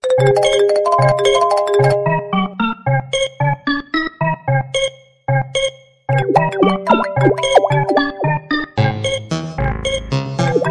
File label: Best Remix Iphone Ringtone